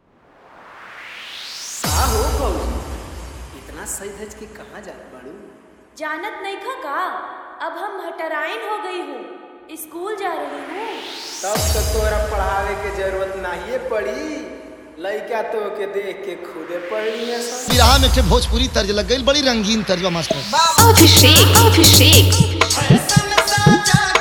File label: Bhojpuri Song Hard Vibration Mix, Bhojpuri Dj Song